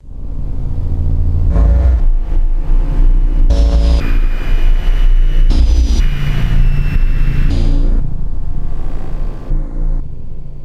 Designed Circuits - Glitch, hum, interference, noise